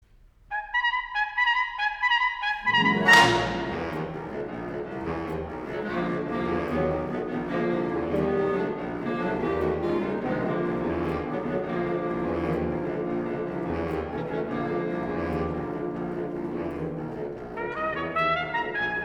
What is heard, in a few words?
Piccolo Trumpet And Wind Ensemble; Concerto For Piccolo Trumpet And Wind Ensemble; Allegro Con Spirito